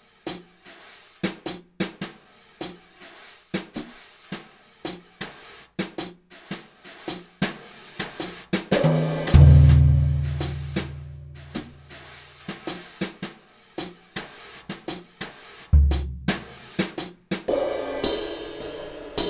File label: Dynamic Brush KIT, Roland SRX-01 Dynamic Drum Kits